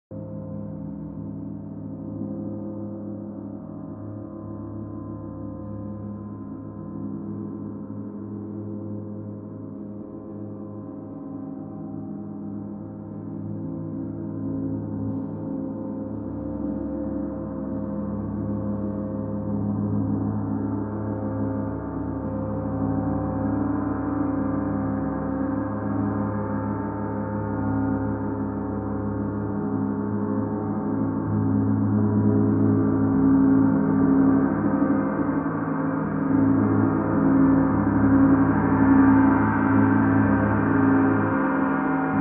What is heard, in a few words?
Mercury Gong